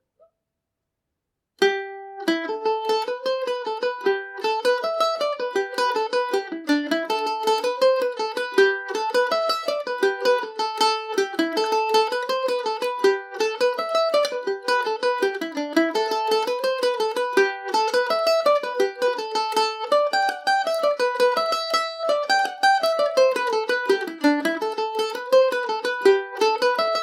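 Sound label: A dorian, irish mandolin; church of dromore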